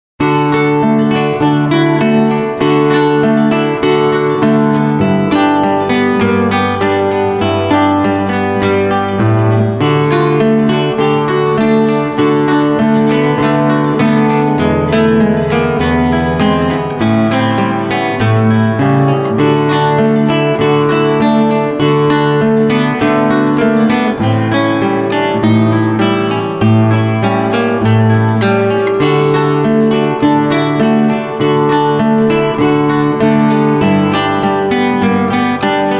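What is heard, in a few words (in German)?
rag, Gitarre Solo; blues; ragtime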